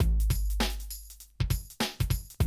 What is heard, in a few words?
drum loop, 100 BPM